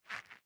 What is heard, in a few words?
armor; smite; ahpuch skin10